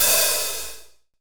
Kit Heavy HHOP; CMI

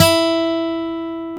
Gtr Acc; CMI

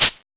CABASA; CMI

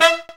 Brass Stab; CMI

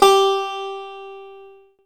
Banjo G4; CMI

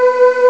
CHOIR, CMI

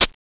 SHAKER; CMI

